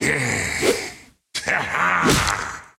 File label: Giant attack; Puzzle Chronicles; PS3; PSP; X360; SFX 3D Giant Spattack